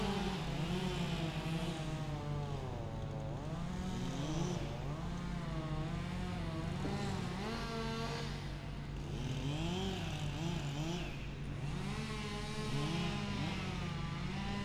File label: Chainsaw Sound